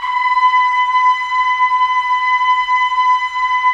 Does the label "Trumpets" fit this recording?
Yes